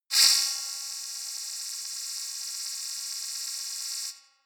buzz

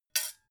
dough hook drop deep; industrie-wirtschaft; gastronomie

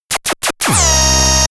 full energy boost

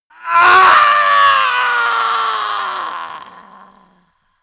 male die gurgling; soundpool

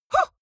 female fight huh short; vocals; comedy-cartoon; soundpool

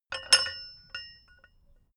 metal bolt ring; industrie-wirtschaft

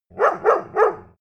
bark three times, hunde; natur-tiere

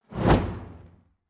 fire torch whoosh fast hard; swooshes-swishes

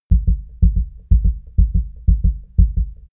heart beat fast sequence short

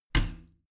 bathroom cabinet both close regular; objekte; tueren

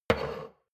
shot glass place table slide medium, gastronomie; berufe; soundpool

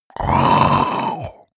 monster choking zombie; comedy-cartoon; soundpool

